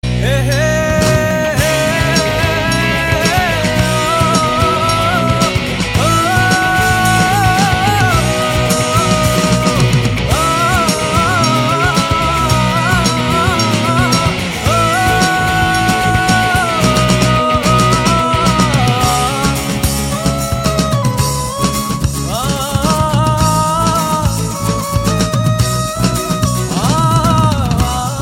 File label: Lovely Painful Tone